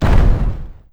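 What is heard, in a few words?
medium explosion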